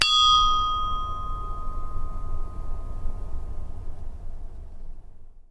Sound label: chinese bronze bell side; sackler